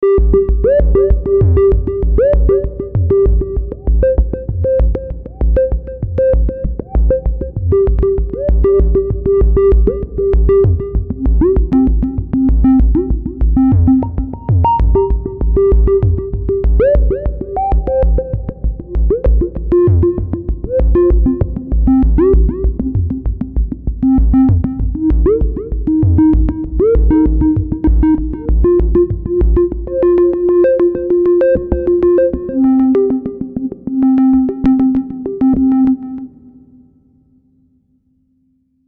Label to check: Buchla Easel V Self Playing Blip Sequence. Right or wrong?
Right